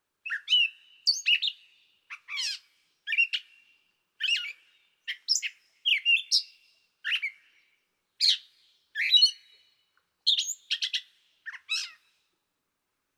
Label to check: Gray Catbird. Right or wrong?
Right